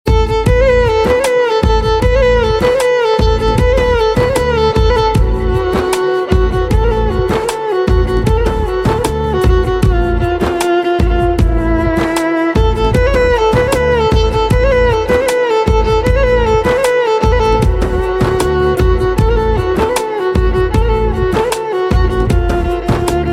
Violin

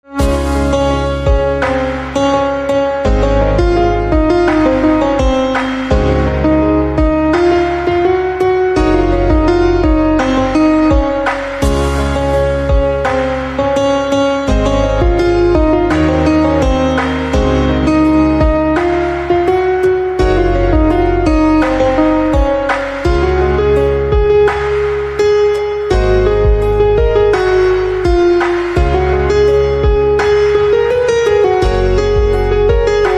Piano; traditonal devotional instrumental ringtones

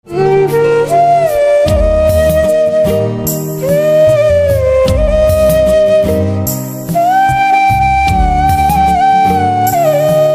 Flute

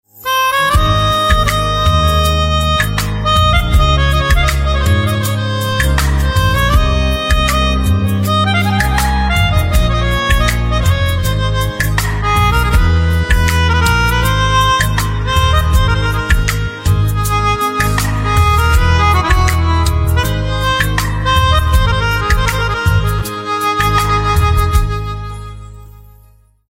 Pianica; instrumental ringtones

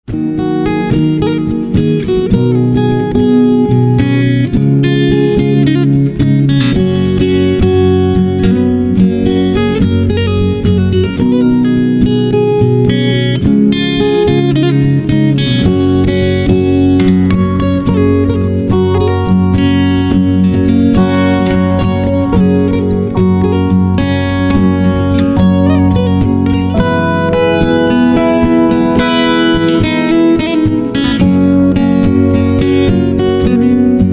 Guitar

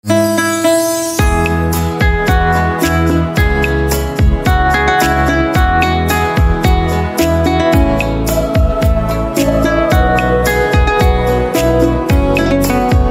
Harp

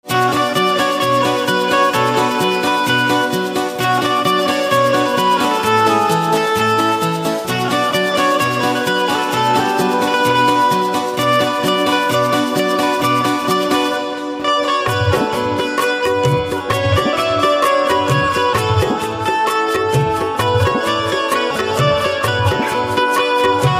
Banjo

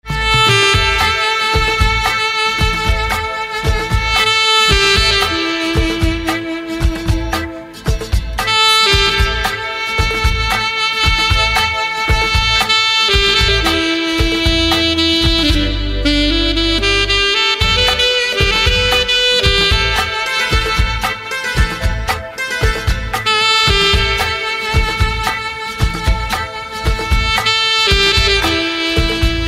Saxophone

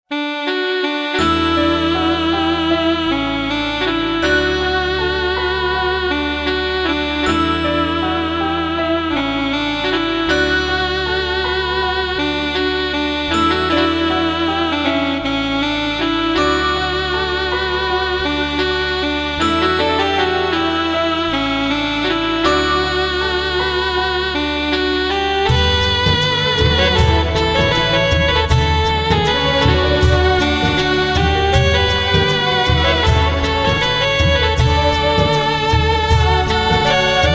Saxophone

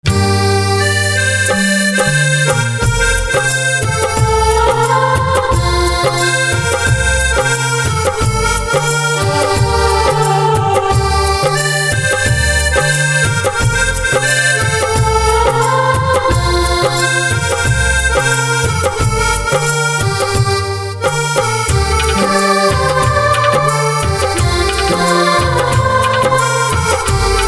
Accordion, instrumental ringtones

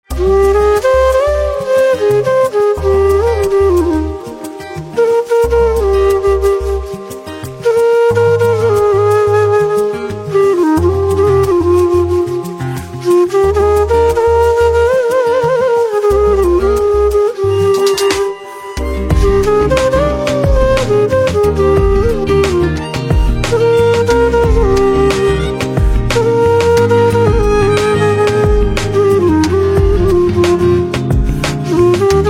Flute

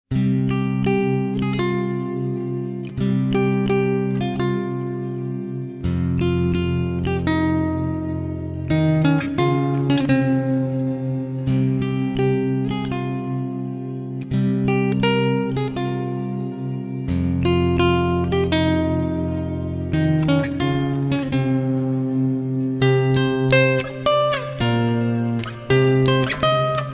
Guitar Slow

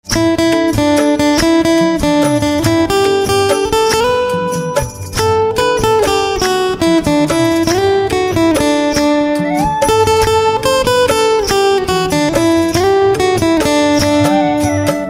Guitar

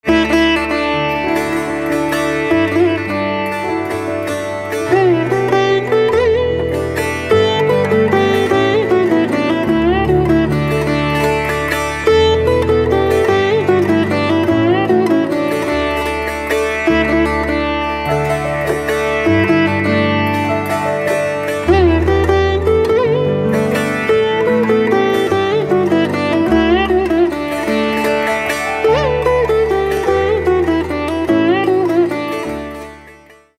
Veena, instrumental ringtones

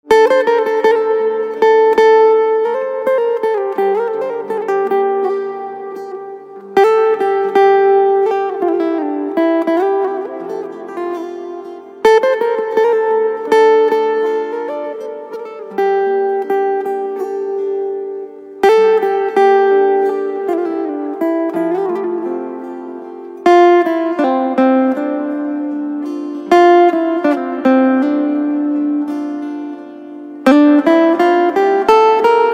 Guitar Slow; veer zaara

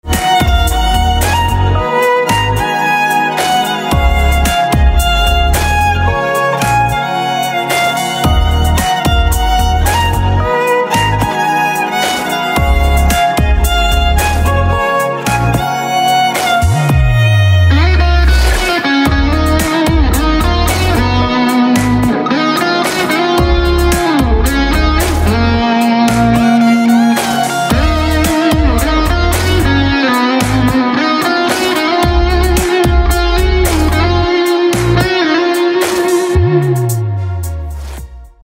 Violin

